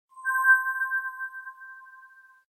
standartnoe sms soobschenie na samsung galaxy s4

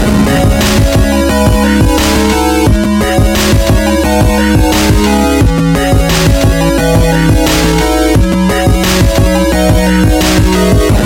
8-bitnaya melodiya

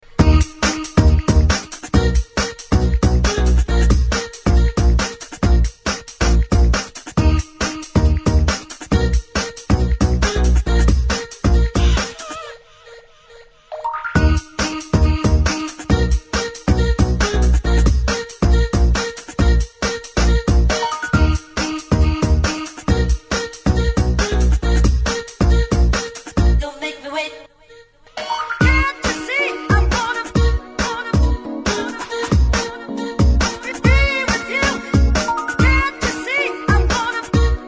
krasivaya melodiya

